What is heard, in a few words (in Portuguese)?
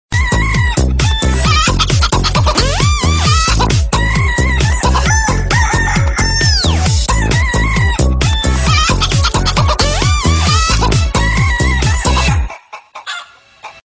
toque do galo ringtone